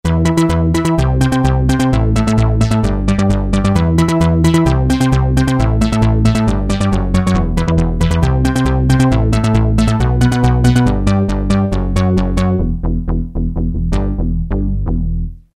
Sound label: Technopop Bs; Roland M-DC1